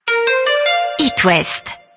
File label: jingle; hitwest